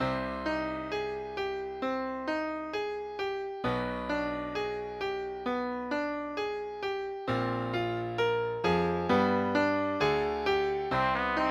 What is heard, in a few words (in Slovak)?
Meditácia pre trúbku a klavír